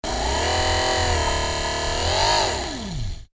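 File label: Mechanism Long Steady, servos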